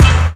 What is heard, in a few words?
TOM FX, TOMS KIT, Ragga & Dub Reggae